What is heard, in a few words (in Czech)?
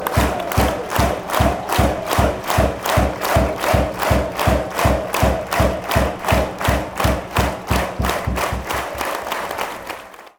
tleskani, fanchants